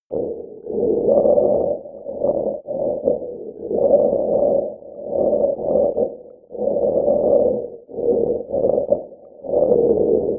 underwater breath, sciene fiction

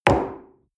coffee place table medium short

